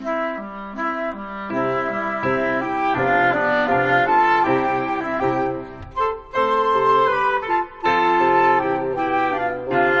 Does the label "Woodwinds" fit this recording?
Yes